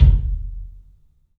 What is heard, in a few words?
PA kick; E-MU Producer Series Vol. 5 – 3-D Audio Collection; 3D Percussives